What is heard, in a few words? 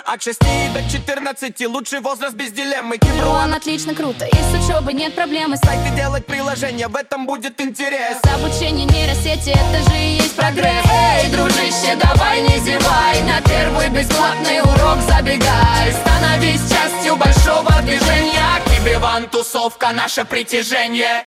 Rap